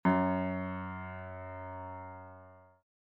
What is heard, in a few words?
Piano ff Gb2